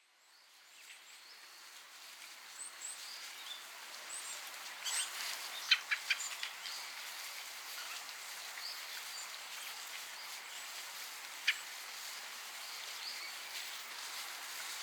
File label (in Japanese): 小金井市野川での早朝のツグミの様子です。 バックでシジュウカラも少し鳴いていました。; 2025年1月下旬に、小金井市野川での早朝のツグミの様子です。; 野鳥の声